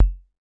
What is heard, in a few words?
ELECTRIBE kick; Kicks; single hits; iElectribe Kicks